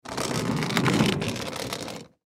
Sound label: Garden Hose Caddy, Garden Hose Caddy Roll Cement Wheels; Rolling; Household; Objects; Blastwave